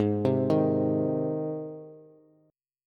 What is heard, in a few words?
Ab Major Ab down Bass; arpeggiated; JGuitar